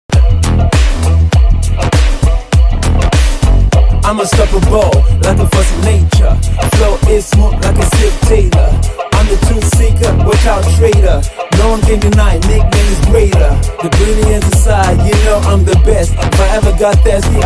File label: bgm fighting mass malayalam film mass kerala music bgm; anjakkallakokkan